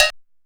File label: Cowbell; Ableton Live 12 Lite; Core Library; Drums